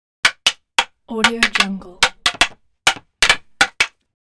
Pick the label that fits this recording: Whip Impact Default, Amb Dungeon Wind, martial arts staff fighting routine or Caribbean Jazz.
martial arts staff fighting routine